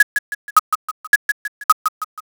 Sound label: ticktock